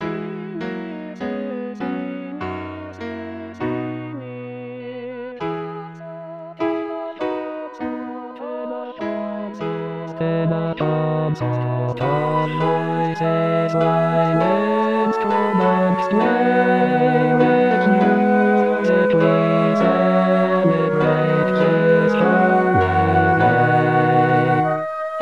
basse, baroque; lent; 2022 baroque

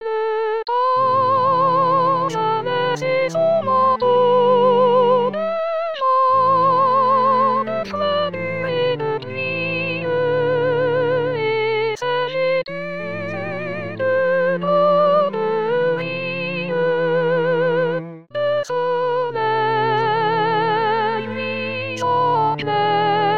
printemps soprano; 2018 st jean

